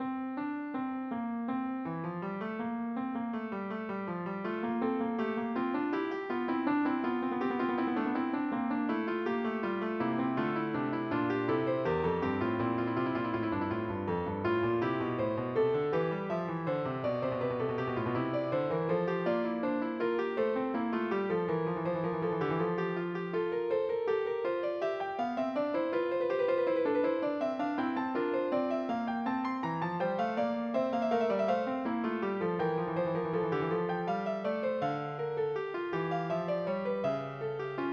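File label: F11 F major; Millennium Gold 2000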